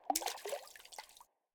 Water Hit; Munin

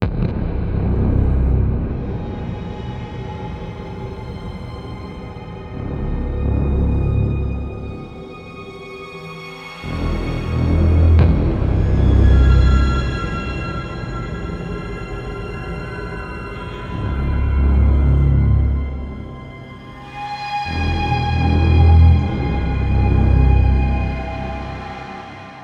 Ambient; dark sector; Cutscene

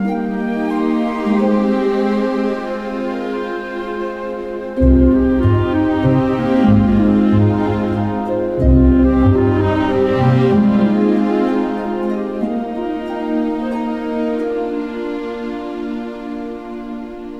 LOOP game over music; Panda

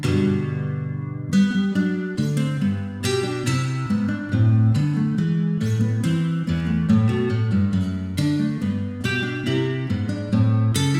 Bouree Loop Nylon Guitar; Bach; Schatte The Witch and the Fake Shadow

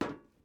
metal step; teardown; ps5 windows xbox series xs

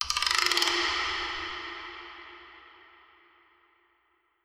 Percus

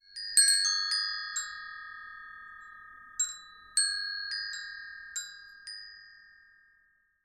Chimes

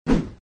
Sfx Enemy Pig Attack; Mighty Knight 2

